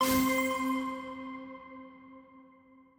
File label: spin Button Up